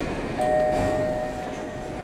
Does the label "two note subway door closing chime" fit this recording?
Yes